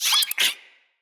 Vocal Gross, Animals; Player; Vocals; Floppet Player

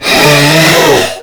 Attack Scream; Warframe; Stalker

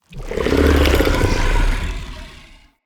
Idle, Vocals; Characters; Enemy; Corrupted Sproutfolk

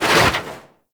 Operator Focus Dash Wave; Warframe; Operators; Tactic

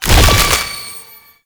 Infested Lich Summon Tentacles Emerge; Warframe; Abilities; Zeke

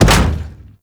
Step Default; Enemies; Steps; Warframe; Corpus; Arachnoid Camper

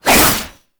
Fist Claw Alt Whoosh; Weapons; Warframe; Tenno; Incarnon

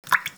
Water Drips; Warframe; The New War; Ambience; Zariman

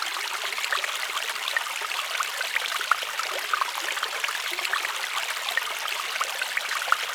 Dojo Rock Fountain; Warframe; Dojo Props

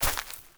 Grunt Step Veg, Footsteps; Enemy; Characters; Corrupted Sproutfolk

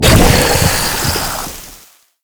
Flying Mouth Spawn; Enemies; Warframe; ManInTheWall

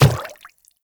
Quadruped Medium Walk Water, Footsteps

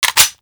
Viper Clip In; Warframe; Weapons; Vipers

